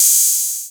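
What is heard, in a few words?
808 hi-hat; Wizoo - Platinum 24 Electronic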